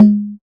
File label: Tabla; Drums; Misc Percussion